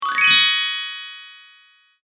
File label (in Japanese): グロッケン グリッサンド